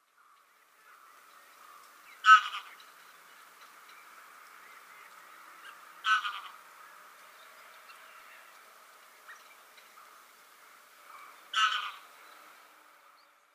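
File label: Greylag Goose, sado; koe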